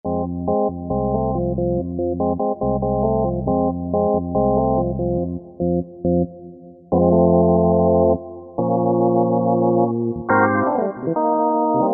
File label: Korg MSC-9S Organ; S-F Vswt.2